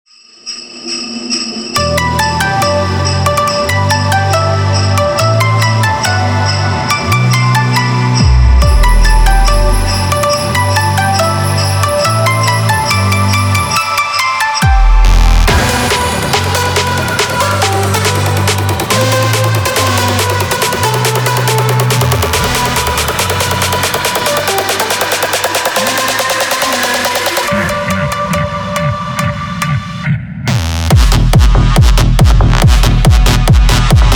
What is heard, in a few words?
jingle bells